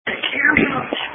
Camera

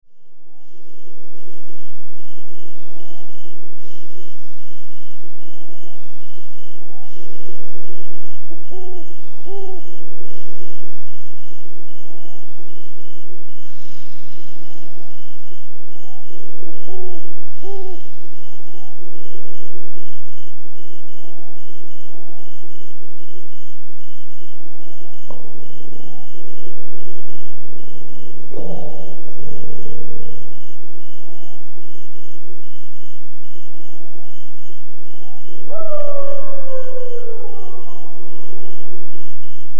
A Walk Through The Woods

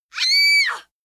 Scream female

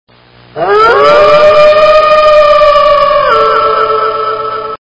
Wolf Howl

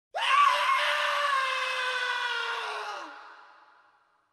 scream Male

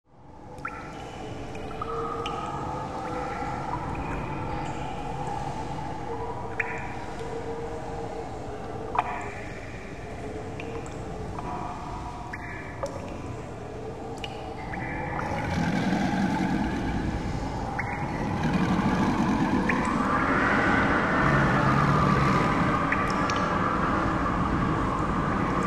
cave sounds

